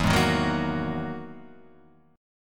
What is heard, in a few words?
E-Major Sharp 11th E, Guitar Open F; EM#11 strummed; 4,3,3,1,1,1; JGuitar